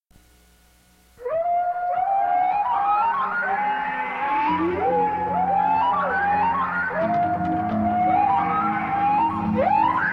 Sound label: whale song